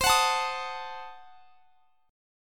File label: C Diminished 7th; strummed; Cdim7; x,x,22,23,22,23